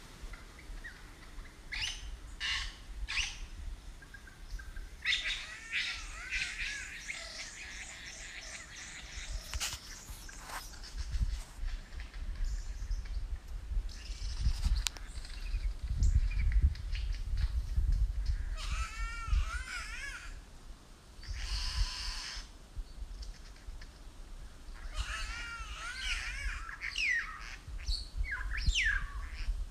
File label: Lyre Bird; Best Of All Lookout